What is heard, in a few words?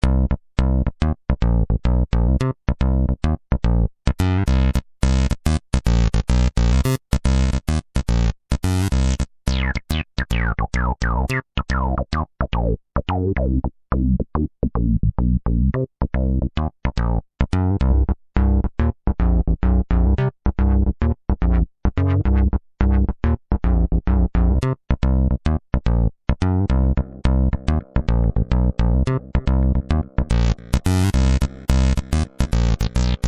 Hard Pulse Bass